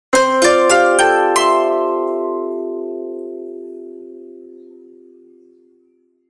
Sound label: logo koto